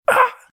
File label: hurt; RadwinIsland